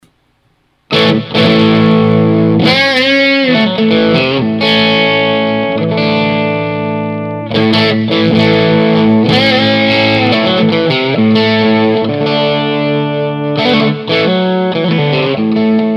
5 positions crunch, guitare

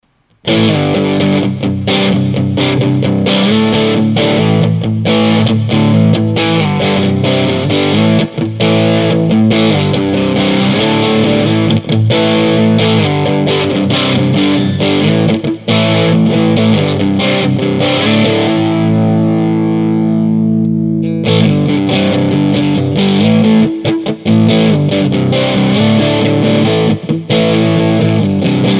5 positions crunch, guitare

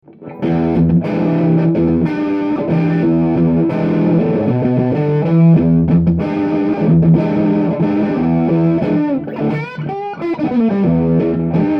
blues fuzz grave, guitare

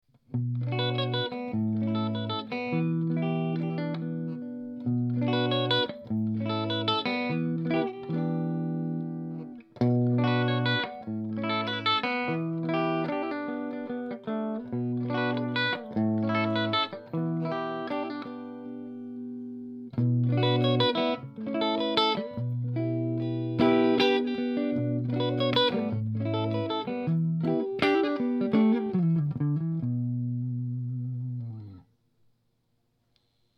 truebucker clair position int; Van Zandt Truebucker; guitare; Test micro Van Zandt Truebucker

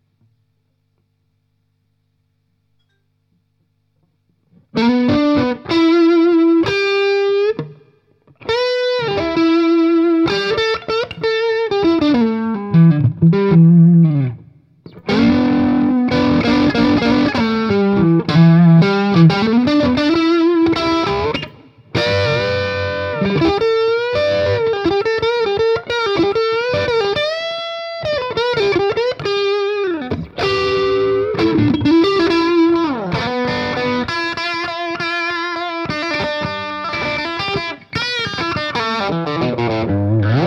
rock treeble, guitare

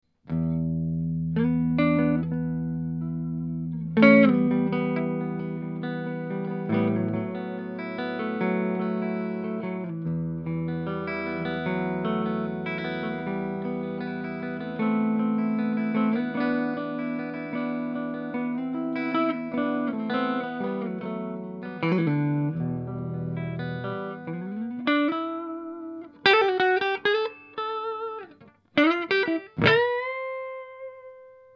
truebucker clair manche, guitare; Van Zandt Truebucker; Test micro Van Zandt Truebucker

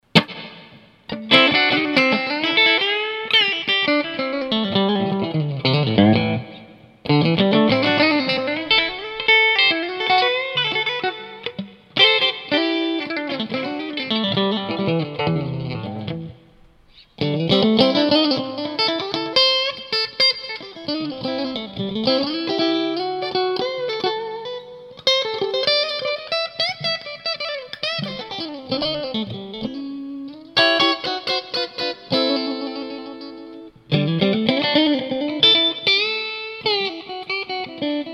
5 positions clair gratouille, guitare

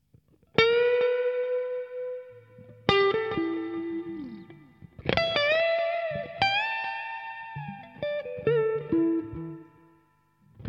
rock clean echo, guitare

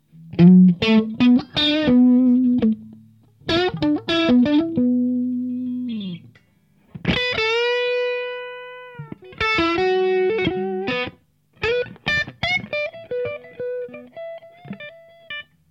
rock crunch solo blues sans effets, guitare

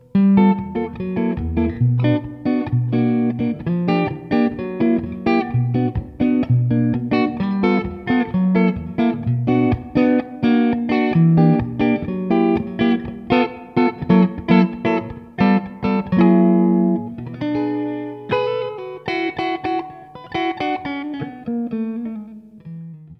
rock jazz compresseur, guitare

